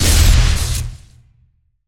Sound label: Sci-Fi Weapons-Rocket Launcher